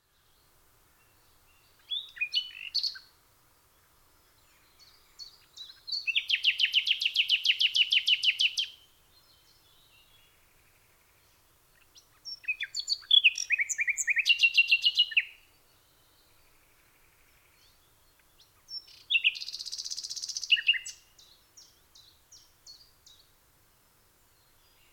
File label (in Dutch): nachtegaal